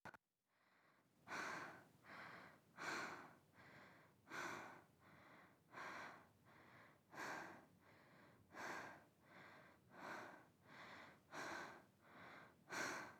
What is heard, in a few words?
Breathing sounds Γρήγορη Fast